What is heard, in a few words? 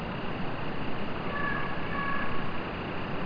Conifer forest; Safari